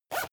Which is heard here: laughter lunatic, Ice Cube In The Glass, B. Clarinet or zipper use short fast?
zipper use short fast